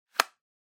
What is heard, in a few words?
Cell Phone Hardware Close